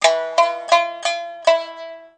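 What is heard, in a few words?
shamisen toot; Musical Instruments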